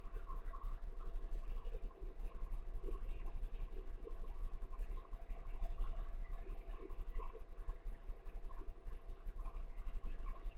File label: Dry Storage EVP